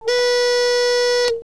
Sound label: Alarm